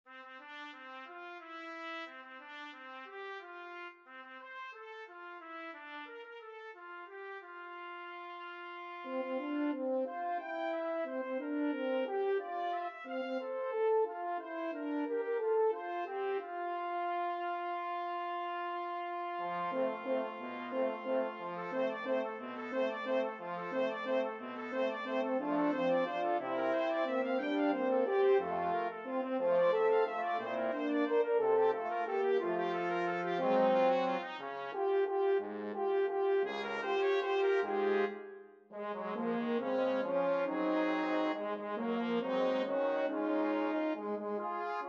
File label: HAPPY BIRTHDAY brass 4tet